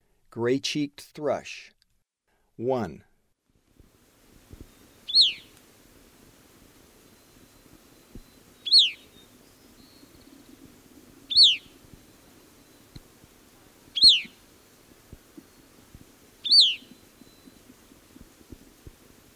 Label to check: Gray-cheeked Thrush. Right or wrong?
Right